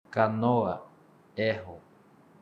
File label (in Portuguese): canoa